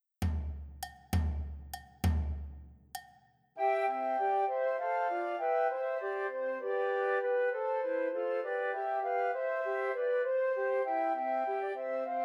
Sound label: Trios for Three Descant Recorders